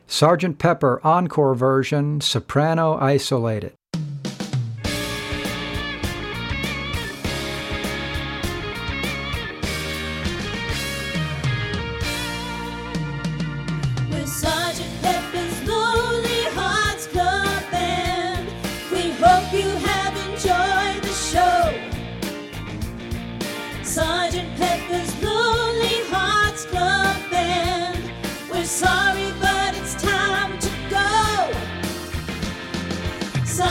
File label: SOP ISOLATED; practice tracks for singers